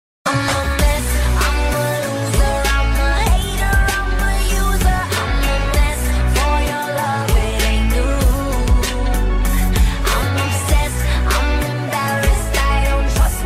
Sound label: english ringtone